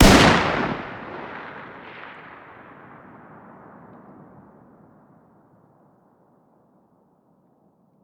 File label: fire dist 308 mg ext; gmod; arccw uc commons attachments